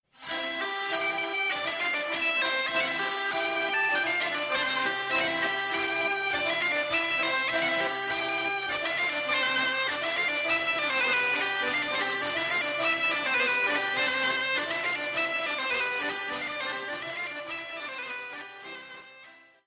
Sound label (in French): POLKA PIQUEE; France